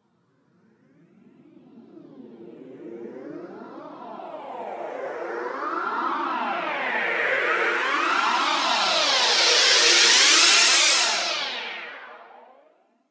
Noise FX; SFX

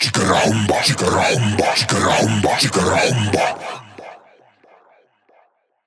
138 Shamanic Voice (Wet), Psytrance

